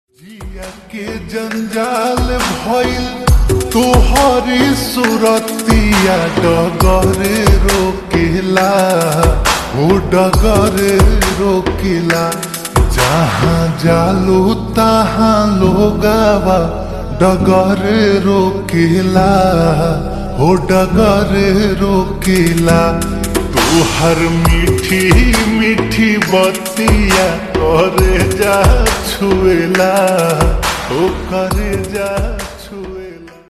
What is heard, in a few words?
Slowed Reverb Ringtone